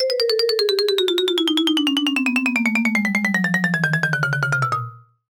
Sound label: comedy marimba descend; sound effects one